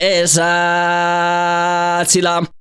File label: VOCAL LINES; Best Service; World Colours